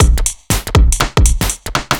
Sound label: Kit 2 Heavy Swing 120; off the grid